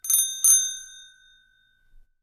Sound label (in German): fahrradklingel